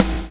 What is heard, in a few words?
BLOW; Spectrasonics - Backbeat